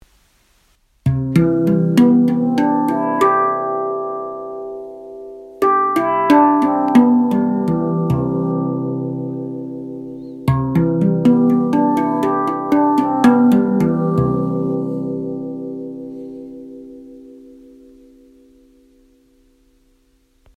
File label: handpan; japan silverado